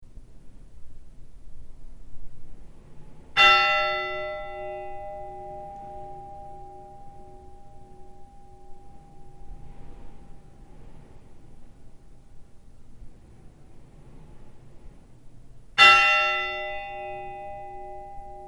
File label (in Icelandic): thingeyrakirkja likhringing, kirkjuklukkur; hunavatns-og-skagafjardarprofastsdaemi